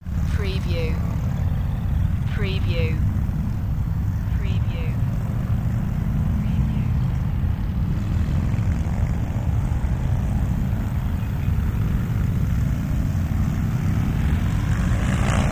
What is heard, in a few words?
Biker Gang Distant